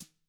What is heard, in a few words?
TAMA; ILIO; Double Platinum Drums 1